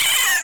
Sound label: screams alien